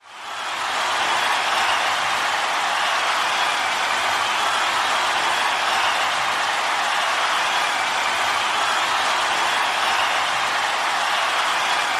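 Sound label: priest witching hour blessed loop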